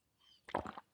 drink swallow

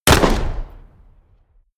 shot

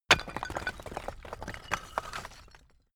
bricks clatter

